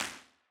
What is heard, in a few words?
Wider Clap